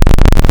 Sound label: Basics Saw Collection; Synthetic's Serum Collection; ESW Core Wavetables; Echo Sound Works Core Tables